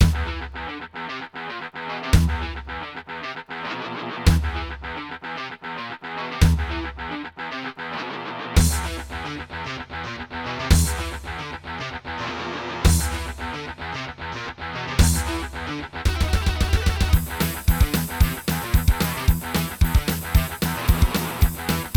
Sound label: diamond db 4mb; soundcard test; Doom